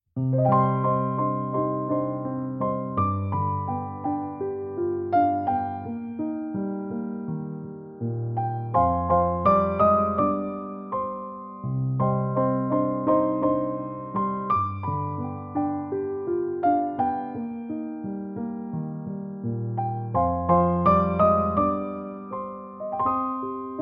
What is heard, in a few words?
Piano Version